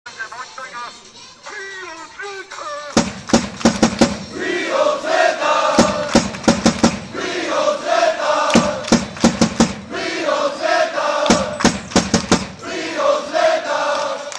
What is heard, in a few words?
call; フリオ・ズレータ; マリン; レツゴートンプソンと同じです(笑）語尾は伸ばさない。